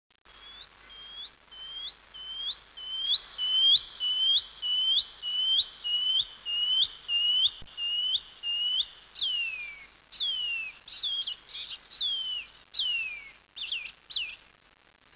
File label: shining cuckoo